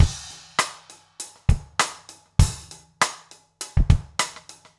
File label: basic funk rims